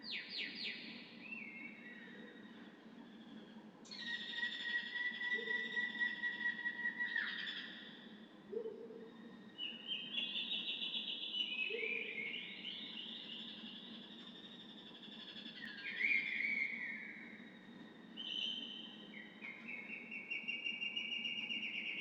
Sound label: Jungle, Daytime Atmosphere; Poetry Project